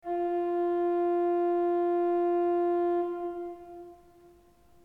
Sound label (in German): ton, orgel